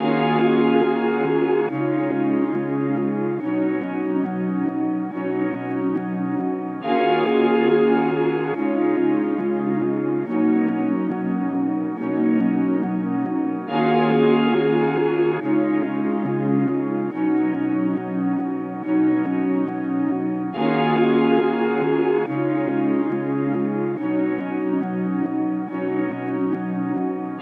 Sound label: 140 BPM D# Min Synth; Melody; Loop Stems; Cymatics; 2022 Melody Collection; Reverse; Dreaming